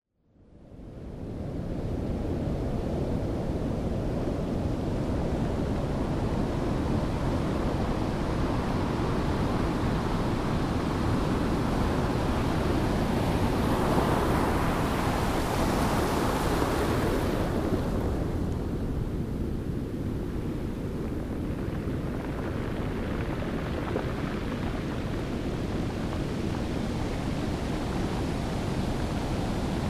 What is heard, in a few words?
surf